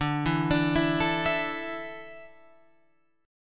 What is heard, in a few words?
D Suspended 2nd D down Guitar Open F; Dsus2; arpeggiated